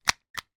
leisure toy car drag racer clip into starter unit; dinky toys

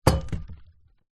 bottle wine in paper gift bag set down; foley

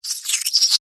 science fiction alien small creature vocalisation

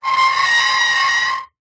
plastic small item scrape screech metal; zapsplat

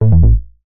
game tone error

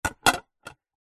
household plastic plate set down

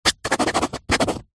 pen rollerball sign signature paper pad; office

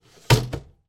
gerbilarium lid slide close; animals

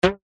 elastic thin stretched ping; zapsplat; foley

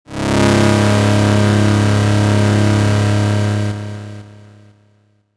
sound design synth stab drone stereo delay

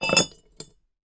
bell school handheld put down on table

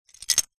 lego bricks x4 set down

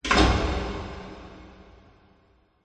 industrial door metal close very large reverb; zapsplat

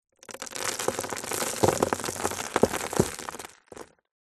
dirt drop onto cardboard box

